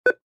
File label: click, atm